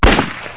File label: MUSKET